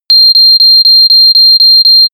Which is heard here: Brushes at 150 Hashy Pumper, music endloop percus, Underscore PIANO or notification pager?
notification pager